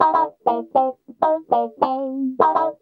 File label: GUI, LICKS